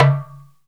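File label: dum, Drum Circle; Doum; Drum Samples; "Doum" sound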